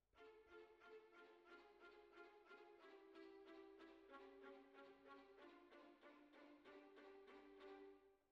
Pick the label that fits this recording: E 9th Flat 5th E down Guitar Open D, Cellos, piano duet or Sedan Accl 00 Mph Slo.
Cellos